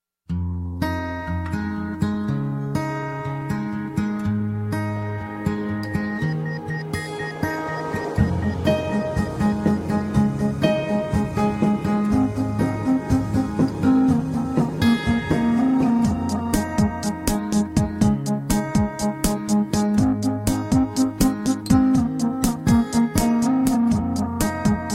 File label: UI Front End Loop